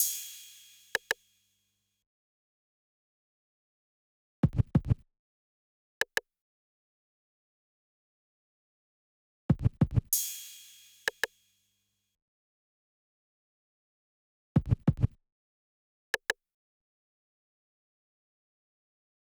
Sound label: Percussion, 95 BPM D# Min; Dope